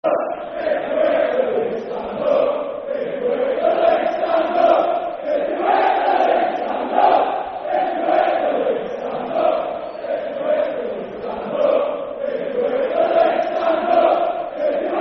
Stand Up If You Hate The Leeds Football Chant (Soccer Song); Bradford City Bcfc